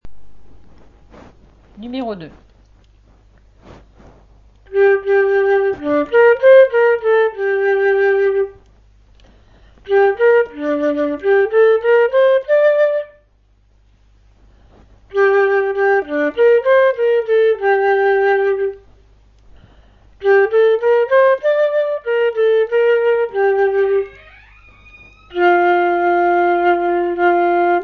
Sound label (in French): chant flute